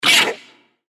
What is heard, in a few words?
mantis chase vox; npc